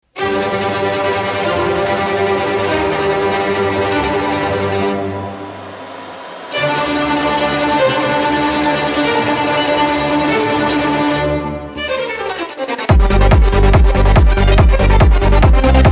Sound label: techno mix; vivaldi